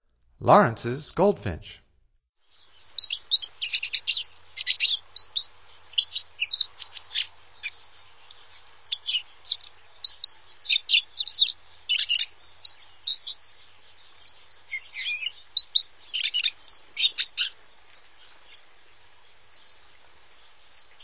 Lawrences Goldfinch, Songs of Birds of North America; Stokes